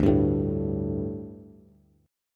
D Suspended 4th D, Bass; strummed

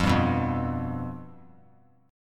F Suspended 2nd Flat 5th, chord; strummed; 17,14,14,15; JGuitar

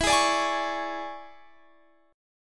E 13th; strummed

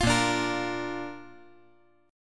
A sharp Major

